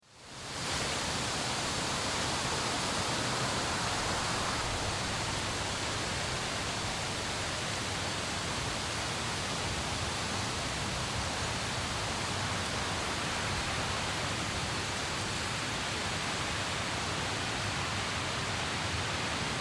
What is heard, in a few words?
Weather Wind Forest Leaves Ambience